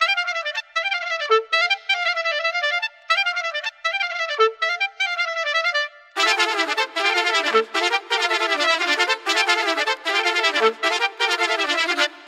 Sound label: horns oboe, Loops, Phonky